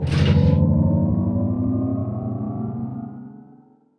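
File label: generator power increase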